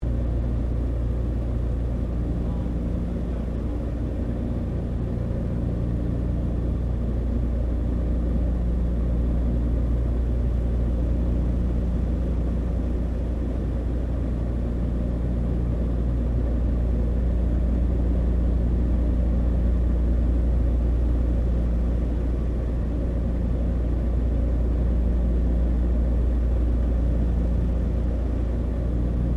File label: AIRCRAFT DOUGLAS DC3 FLYING INTERIOR; Qantas 1942 DC3; Airplanes; Vehicles